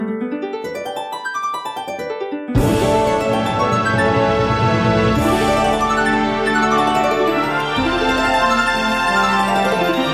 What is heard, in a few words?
Harp; The Legend of Zelda Skyward Sword